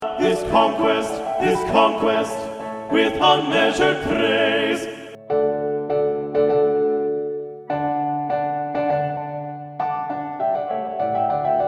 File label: sing unto god accompaniment; honor choir